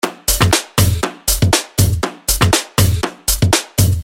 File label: drum loop